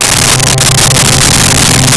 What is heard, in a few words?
Cello C3; Nintendo; July 2020 Gigaleak